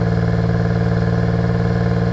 Car Loop; urbanchaos

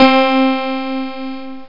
country piano; OctaMED SoundStudio V1; jazzies samples

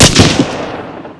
mauser 98 fire; weapons; Spearhead Demo; MacFormat 2003 June

